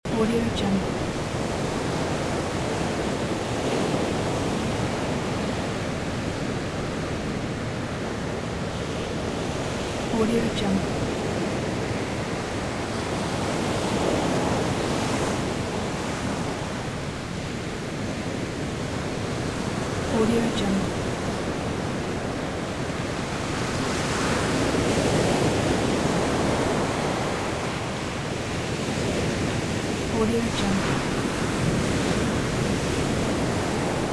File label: ocean waves on beach sand